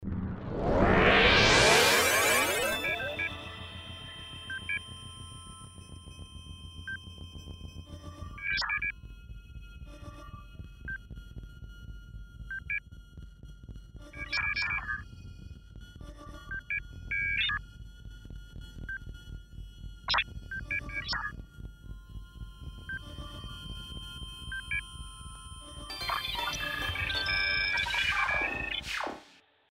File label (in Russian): effekt gologrammy